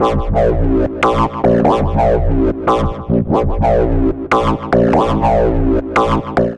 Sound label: A# Minor 146 bpm, bassline; MIDI Clips; Tonal; Cyclon; Skitter and Step; Ableton Factory Packs